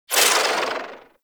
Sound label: Rifle Projectile Tail; Weapons; Warframe; Corpus; Ruban